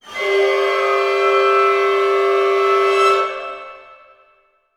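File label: orchestra; Masterbits - Switch FX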